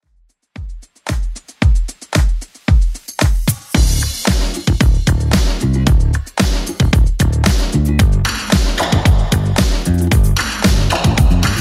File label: 80s Club 113bpm